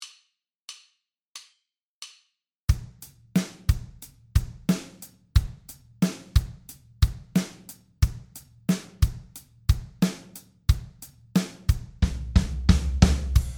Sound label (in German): Rhythmus Tempo 90